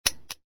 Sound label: click